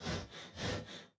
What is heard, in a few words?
breathe; Rust